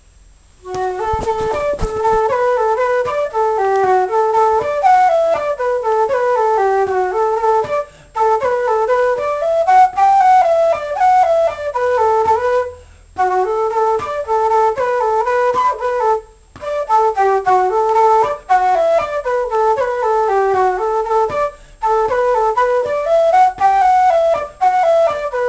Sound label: rumbles, jig